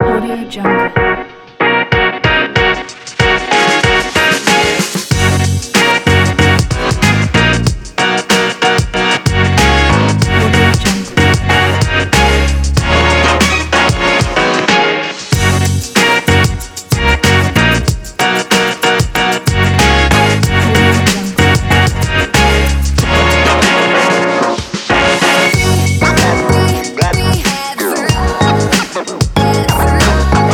hip hop

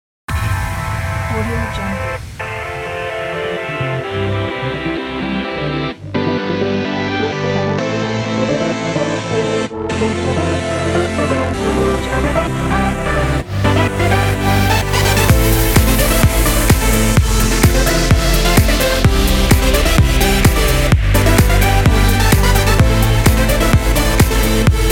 edm

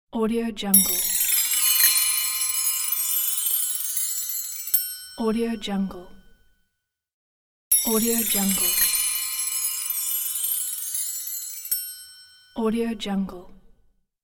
bonus collected coins; phillipmariani

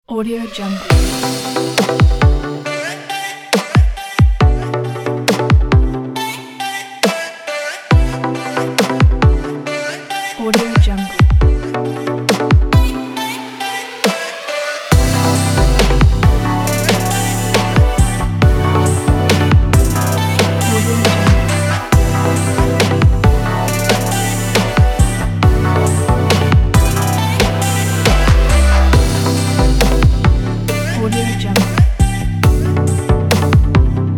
fashion trap; stockproduction

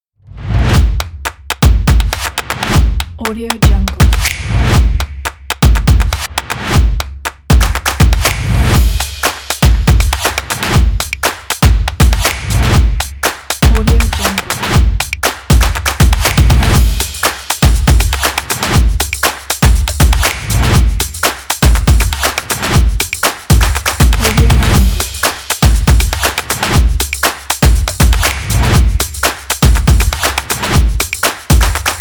the percussion kit

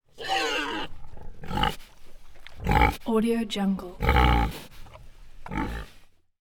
pig snort; collanderson

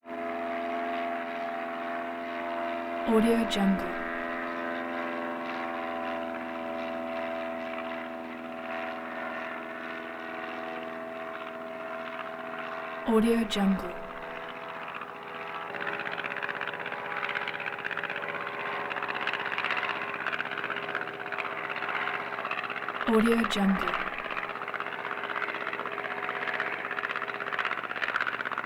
turboprop plane single engine hum and buzz

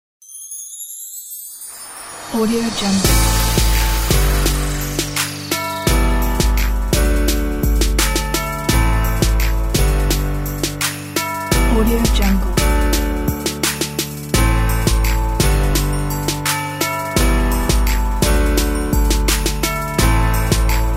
christmas epic trap

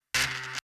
static rough

